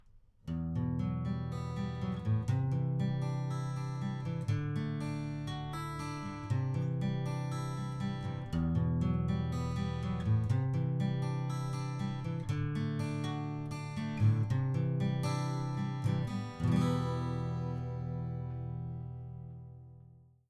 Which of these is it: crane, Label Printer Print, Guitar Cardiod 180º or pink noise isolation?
Guitar Cardiod 180º